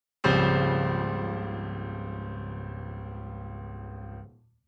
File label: chord test